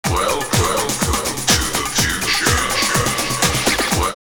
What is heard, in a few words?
drumloops Rave 124; X-Static Goldmine; 90 s Sample CDs